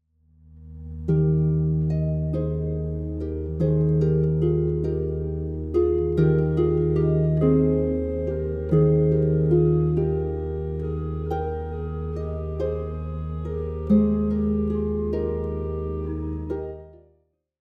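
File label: Pastorale, harp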